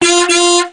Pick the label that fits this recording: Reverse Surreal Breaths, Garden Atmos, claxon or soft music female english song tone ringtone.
claxon